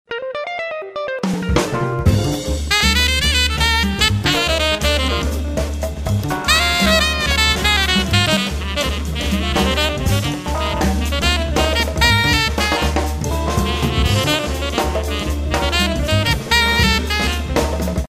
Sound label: Jazz Bar